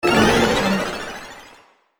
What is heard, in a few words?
health spell